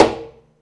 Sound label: pizz noise body slap high, double bass; dsmolken; noises